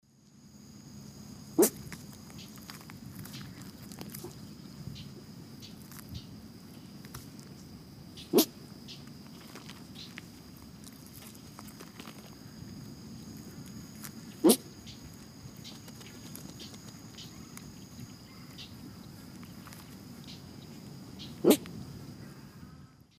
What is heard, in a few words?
Coragyps atratus, calls; S. America; Eastern Lowlands